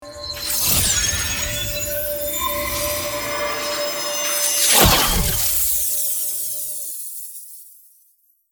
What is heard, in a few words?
Magical fairy attack spell